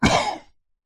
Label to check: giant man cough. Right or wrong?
Right